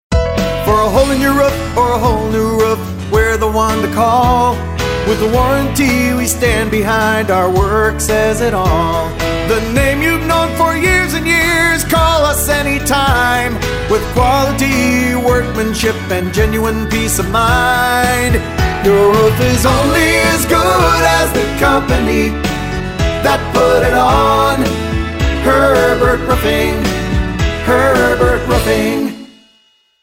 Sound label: Roofing Jingle Full Sing; Herbert Roofing Jingle 30